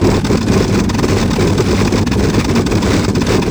Aircrafts Engines Packard Merlin Prop Cockpit Ex R In; DCS